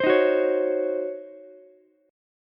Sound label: C sharp Major 7th Suspended 2nd Suspended 4th Ukulele; C#M7sus2sus4 strummed; JGuitar